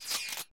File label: Cellphone Sound